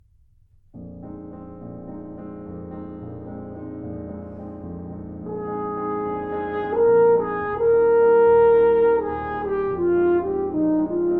Suite for Horn and Piano - Mvt. 3. Song; Alec Wilder

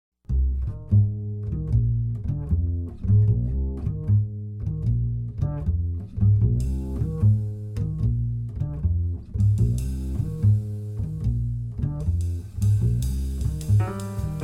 Quartet

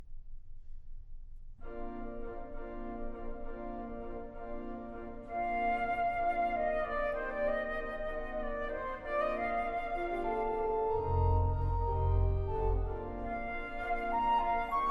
Three Pieces for Flute and Organ - III. Cavatina

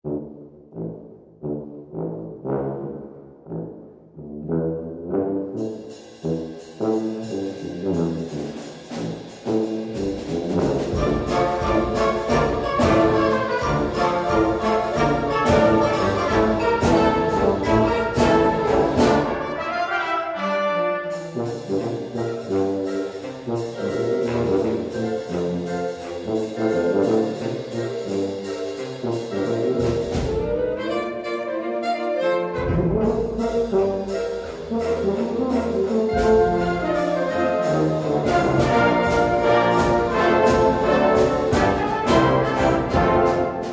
Suite Tuba, Swingin

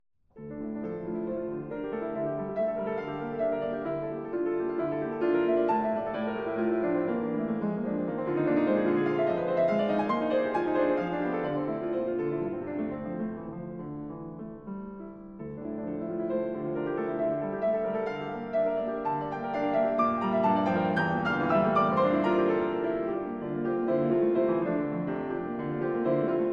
Preludes, Allegro Con Moto, Piano; 20th Century American Piano Music

